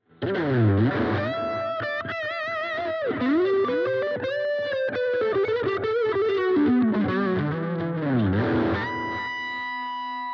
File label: DUAL CUBE Hi Gain Stack wet Lead